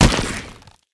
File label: pillar hit; smite; god ganesha skin11